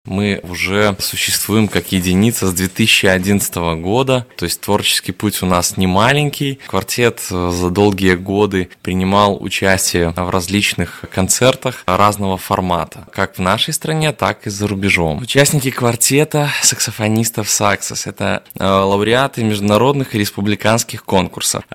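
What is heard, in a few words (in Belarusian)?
Saxas квартэта саксафаністаў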